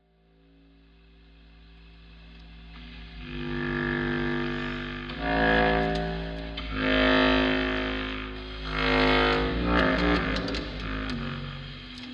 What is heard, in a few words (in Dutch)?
Fragment uit Schlaf voor twee Contrabasklarinetten; Enno Poppe